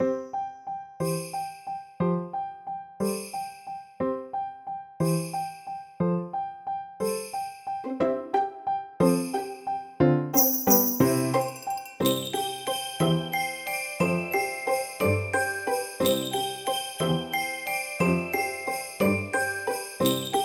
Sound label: music